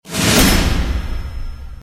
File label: start Game